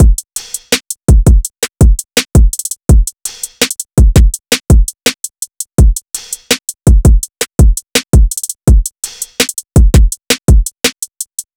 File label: Drum Loop 166 BPM, Trap; Chamber; Cymatics; GHOST (Beta Pack)